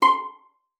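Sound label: violin pizzicato C5; Xsample VOL-5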